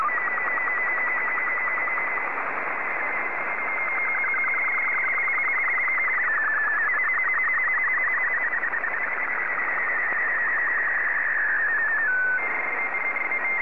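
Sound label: SSTV, Robot 12 BW; JA3AJQ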